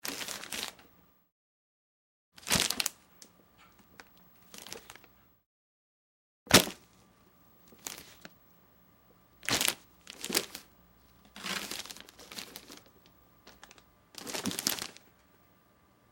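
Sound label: Foley Christmas Present Moves Grabs, crinkling; Crinkle; Xmas; Objects; Big Room Sound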